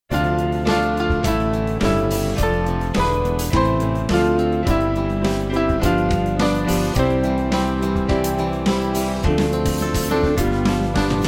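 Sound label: Band, Music Backing Files for Churches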